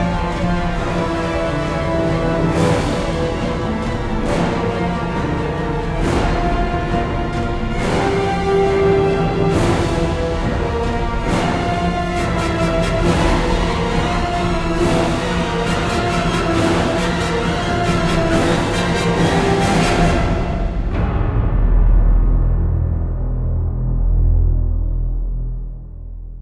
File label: Swarm Fail; mass effect 2